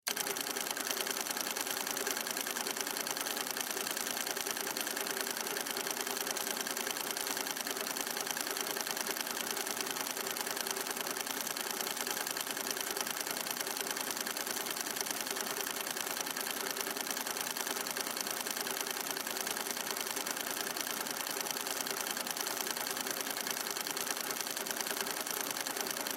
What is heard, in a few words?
Projector 35 Run 10 Frames Per Sec Side Door Off Long; Technology; Blastwave